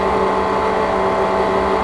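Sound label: move loop, platforms; movers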